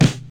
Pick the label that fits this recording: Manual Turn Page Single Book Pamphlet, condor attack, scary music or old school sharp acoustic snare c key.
old school sharp acoustic snare c key